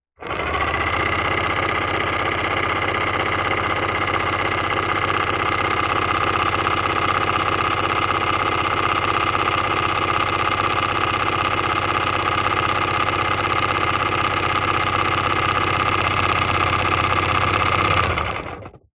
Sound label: industrial engine motor generator on run off electric